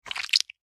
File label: Horror Squish Suction Blood; Monster Sound Effects; Monsters (Sound Effects:Horror); SFX Bible 6